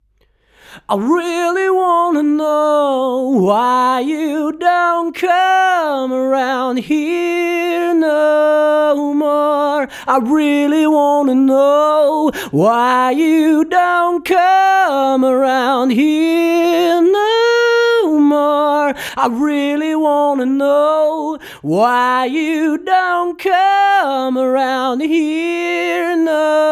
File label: vocal